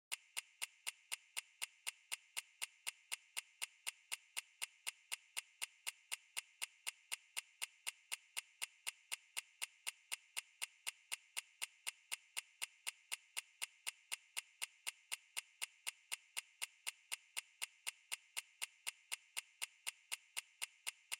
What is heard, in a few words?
Timer 60 Seconds